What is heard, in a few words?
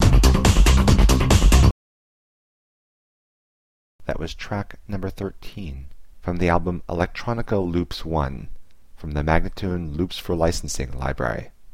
140 C ambient teknology spoken; Electronica Loops 1